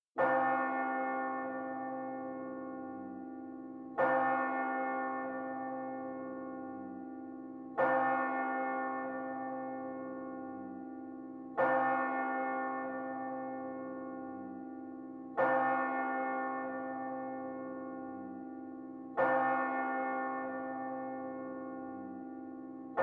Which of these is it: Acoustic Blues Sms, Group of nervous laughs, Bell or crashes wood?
Bell